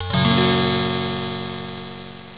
chord; Deliantra

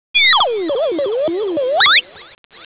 sci fi gun; Deliantra

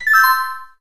heal; deliantra

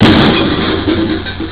Metal Crash; Deliantra

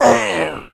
orc die; deliantra

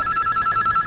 ring

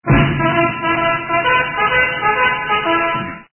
bugle charge; deliantra

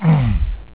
phit; Deliantra

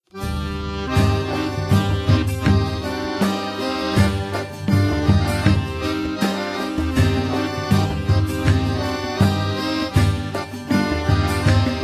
music; deliantra

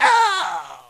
goblin die; deliantra

